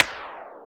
CYBER, Drums; Drums In Your FACE!; CYBER PRC

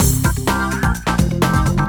Lounge Breakz, 127

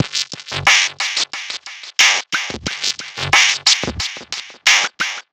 Gated Beat (90BPM); musicradar

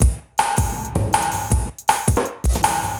Verb Beat, Drum Loops

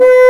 BASSOON B4, WIND; AKAI S-Series CD-ROM Sound Library VOL-2

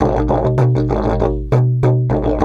DIDGE 100BPM

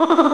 Evil Laugh; SNES; Dossun! Ganseki Battle

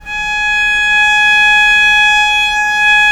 VL G#4, VIOLE, Historical Instruments; USB Soundscan vol.23

